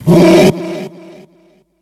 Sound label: Great Hungry Hunger Wolf Death; Kilta